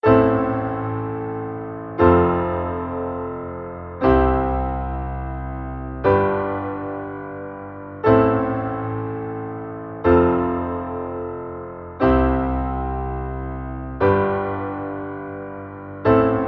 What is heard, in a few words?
Four chords song chords